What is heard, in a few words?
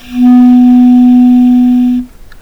Bb Clar B3